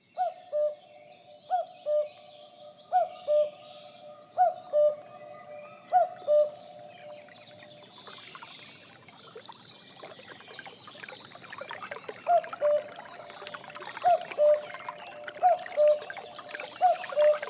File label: Maple Sap Song, ru